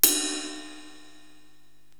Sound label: Ride Edge, Korg TR-Rack drum machine